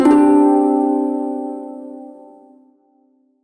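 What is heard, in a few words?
Prime Shawzin Pentatonic Major Chord, Music; Warframe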